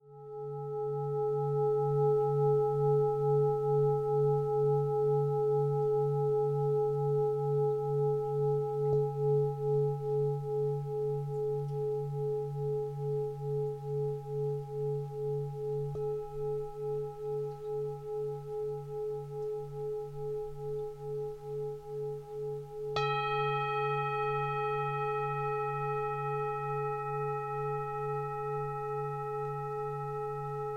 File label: Tibetan Bowls Humming Binaural Recording